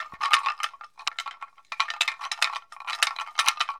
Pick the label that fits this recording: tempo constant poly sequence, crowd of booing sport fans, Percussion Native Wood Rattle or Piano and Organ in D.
Percussion Native Wood Rattle